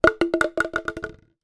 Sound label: foley bottle plastic thick empty drop on hard surface; zapsplat